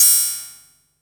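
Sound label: MISC Right, Techno 138 BPM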